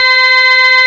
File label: NZ BEE C5, SYNTHESIZER; GLUNGE